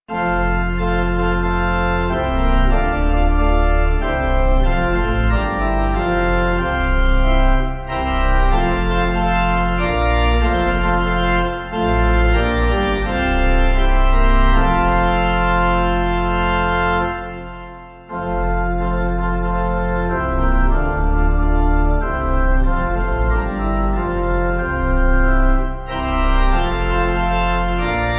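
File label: Pipe Organ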